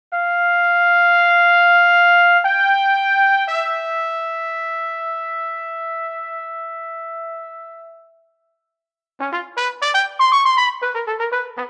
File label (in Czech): TROMBA celek